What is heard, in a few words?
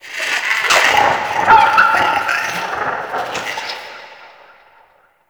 Under City Medium Creature Chatter